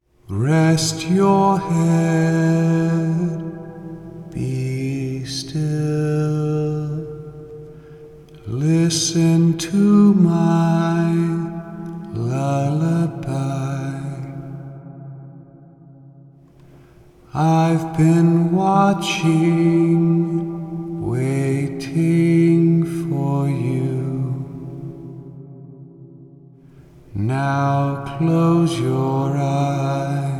Lullabye; Stork Lullabye

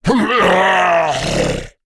Creatures Fight Death; Mighty Party; Android

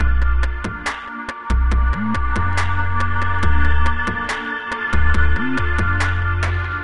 Music Loop

